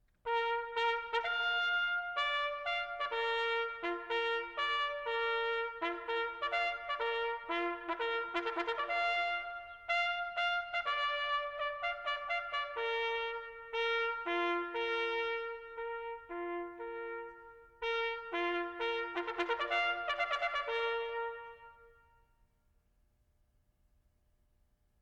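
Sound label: retreat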